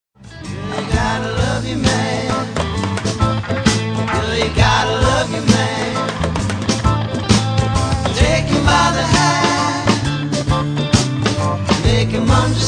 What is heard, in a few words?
Riders reggae